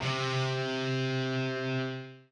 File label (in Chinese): 电吉他 C5; th13